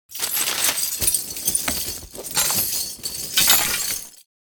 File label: Medieval armor and leather uniform handling